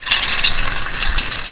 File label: cooking; openrsc